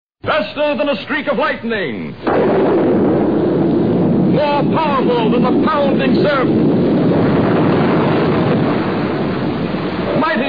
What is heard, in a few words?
superman cartoon